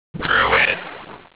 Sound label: shrink; druid